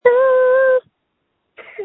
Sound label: screams